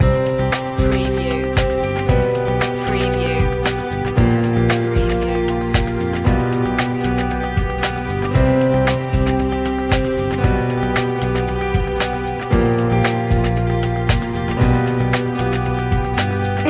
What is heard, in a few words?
corporate achievement loop